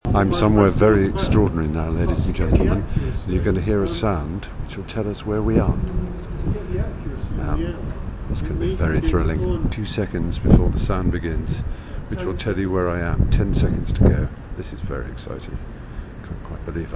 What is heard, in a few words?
Big Ben